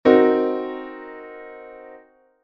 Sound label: chord